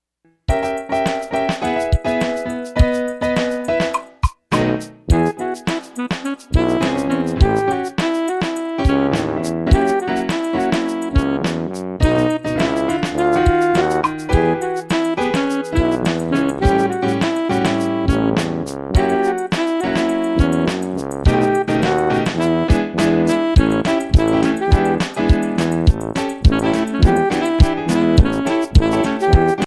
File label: disposal engineers instru; badwolfpress